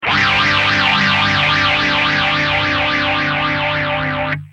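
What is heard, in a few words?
guitar, Mutron phasor II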